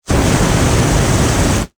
Flame Hand Booster, Scifi, Flame, Hand, Booster, Long, Burst, Science, Fiction; Spacecraft; BlastWave